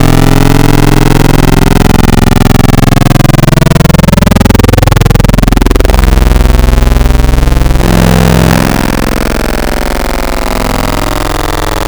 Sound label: Digi Sweep, Wavetables; Cymatics; Wavetables Collection Vol 3; Serum